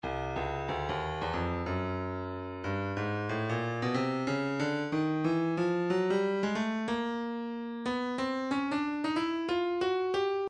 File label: breakbeat 092 bpm; Bandana A; Stylus Breakbeat Midi Files